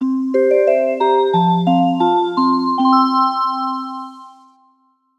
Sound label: in train; Yokai Watch 2